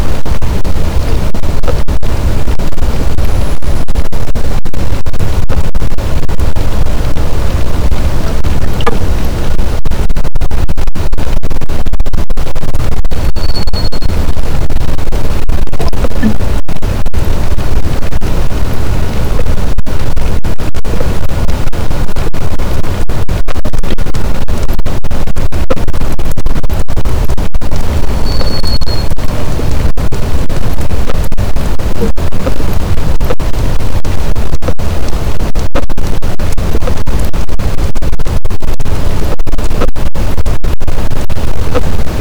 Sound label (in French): cachalot positif, boat, Physeter Macrocephalus